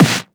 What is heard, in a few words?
snares; Vengeance Samples